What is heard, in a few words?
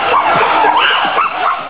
monkey